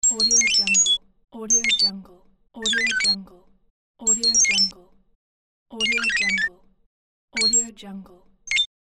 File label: droid robot talk